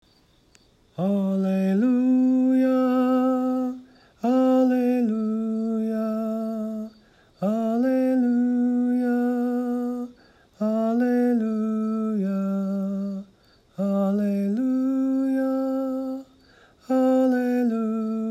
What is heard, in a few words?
Alleluia soprano